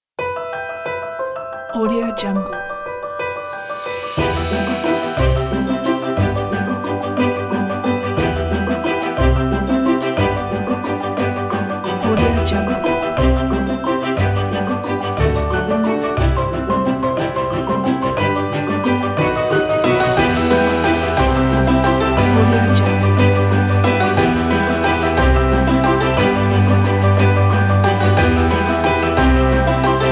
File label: inspiring advertising